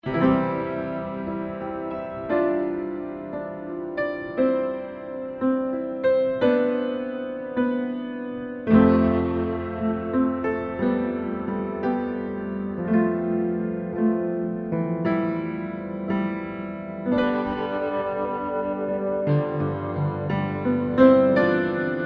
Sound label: Piano; Jills Piano